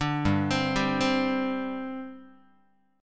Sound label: G Suspended 4th G down Guitar; arpeggiated; JGuitar